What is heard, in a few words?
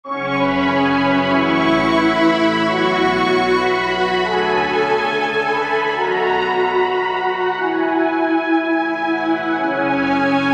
Rich Strings; V-Synth